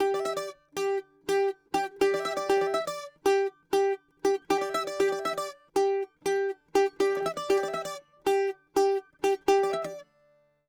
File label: bouzouki